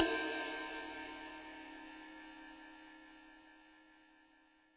HiHat & Crash, Roland TD-20; HiHats

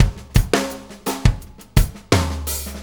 Drum Tools, Loops

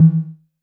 vermona drm1 mk3 tom

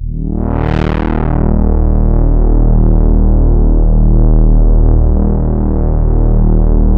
MONO PAD; PADS

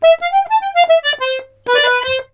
BOUREE, Accordions; USB Soundscan vol.40; AKAI

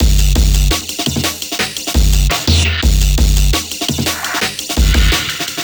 170 Break, Loops; M8

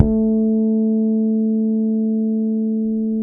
HARMONIC A, PEDULLA BASS; Masterbits CLIMAX 7 - Bass

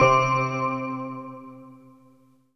NU BEL, synbell

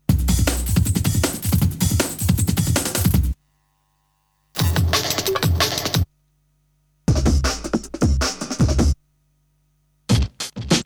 Breakbeats; Kniteforce; 1000 Samples Volume 1

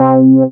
SYN SH, Roland; Sound & Vision - Gigapack I CD 2

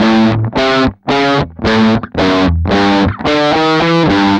GUI, licks; 110 LICKS

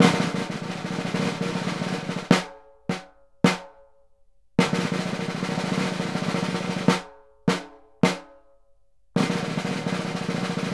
SNR, Synclavier Percussion 2 - World & Orchestral

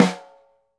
SNR, Synclavier Percussion 2 - World & Orchestral

